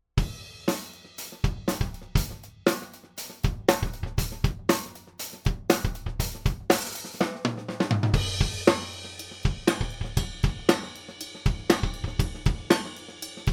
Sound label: Funky Drum